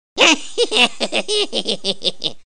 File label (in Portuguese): risada hehehe